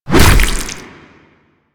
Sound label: Infested Ancient Tentacle Swing Hit; Warframe